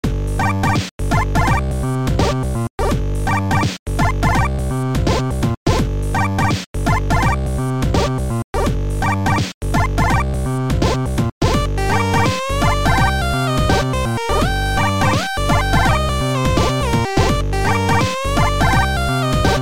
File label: Jazz Reggae Fusion, chiptunes